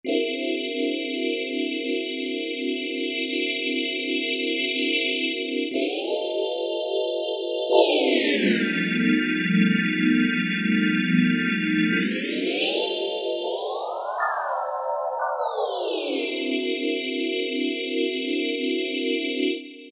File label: Eerie; Generalmusic Equinox